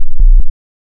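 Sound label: waveform FM sine triangle silence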